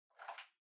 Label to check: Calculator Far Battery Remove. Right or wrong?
Right